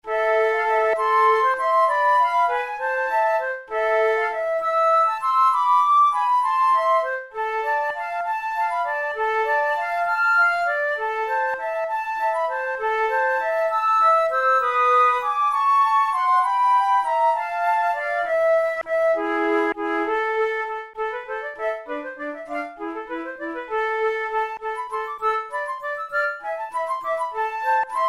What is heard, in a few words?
andante; Mercadante; tre duetti concertanti